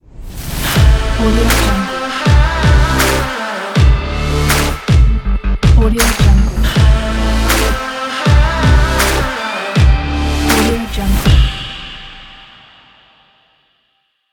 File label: stylish energy logo